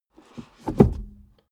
suv release reverse gear; verkehr transport; autos

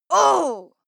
female fight moan pain

